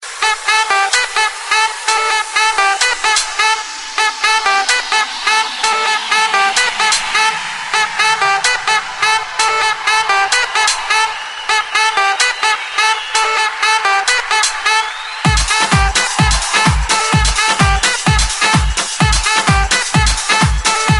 saxo

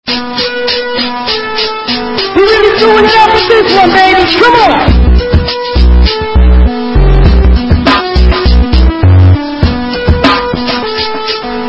bass test mix

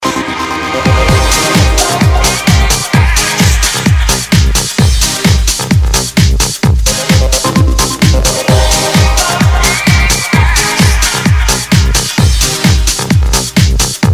Future Trance

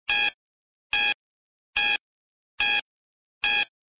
Warning

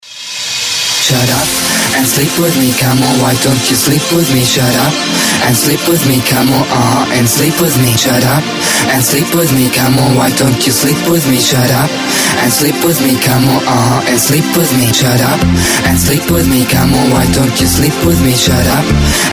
Club

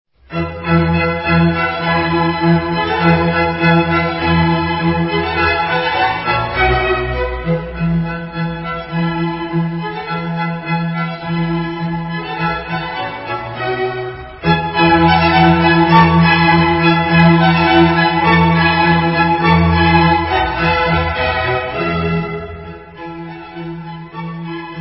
Vivaldi Spring Allegro

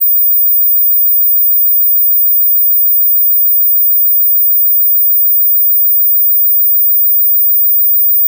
ringtone

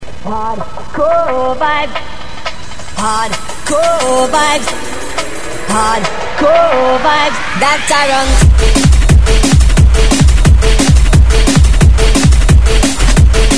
remix, Drum'n'bass

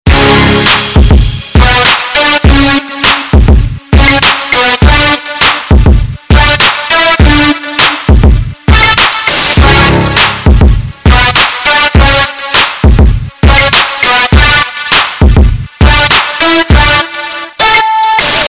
Bass remix

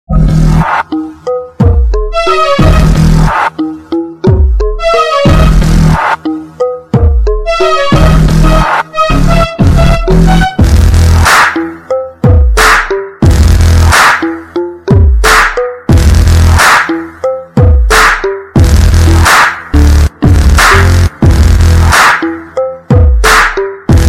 BASS

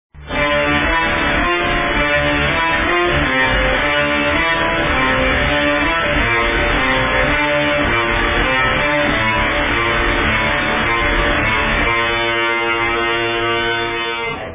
Oktaver

